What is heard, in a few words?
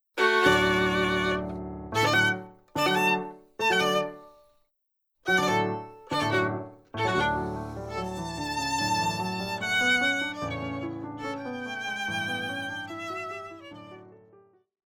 Beethoven Violin Sonata; op12 no1 movt1